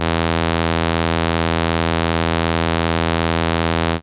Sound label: Trumpet E2, ARP Solina Unmodulated; musicradar-synth-samples